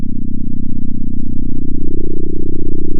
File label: pop, sound of a small motorcycle